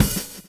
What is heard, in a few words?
groove; AMG Gota Yashiki Groove Activator